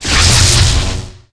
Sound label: Electro Hit